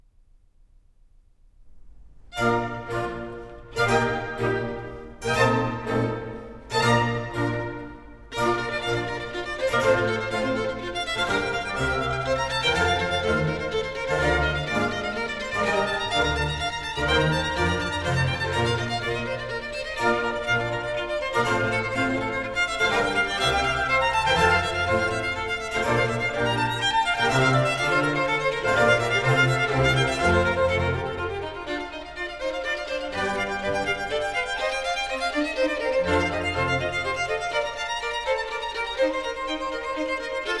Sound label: Concerto Grosso in B flat major; Handel Masterworks; Klassika